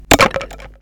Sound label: Falling Bamboo